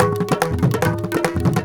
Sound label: India, Heart of Asia